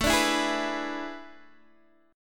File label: A Major 7th Suspended 2nd Suspended 4th, Guitar, DADGAD; chord